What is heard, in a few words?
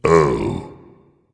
ugh dragon; fafnir